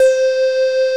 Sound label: CS-15; Masterbits; Vintage Voltage; LEADS